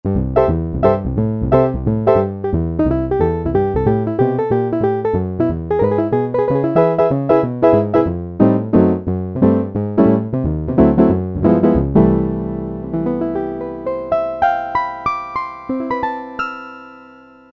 Rox Piano; '60s & '70s; Roland SR-JV80-08

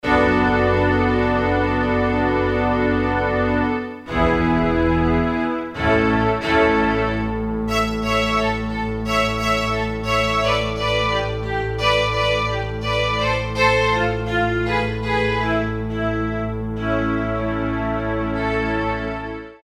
STRINGS, Korg DSS-1

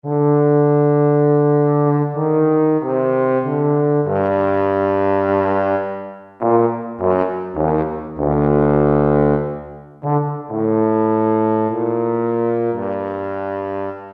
Tuba; Roland SR-JV80-02 Orchestral

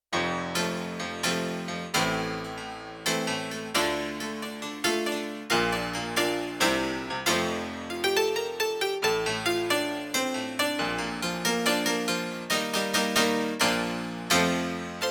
Harpsachrd; Yamaha TX16W; Yamaha CFIII Grand Piano; CP-30-RMI Harpsachrd

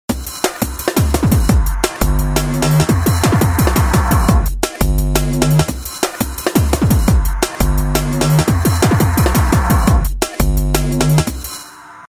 Hardstep, Korg ES-1; Factory Patterns

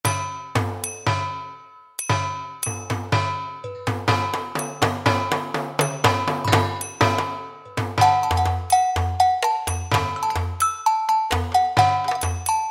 World Jam, Kurzweil K2000; Kurzweil K2000 Contemporary ROM

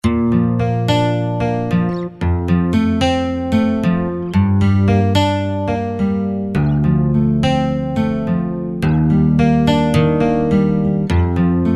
Solo Steel, Roland JV-2080

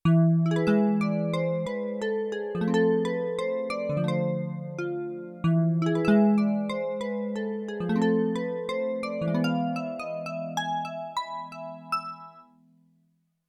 Gitarimba, Yamaha TX16W; Combo Performance 2

